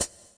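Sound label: hi hat tip pedal closed, Roland TD 7; DRUM MACHINES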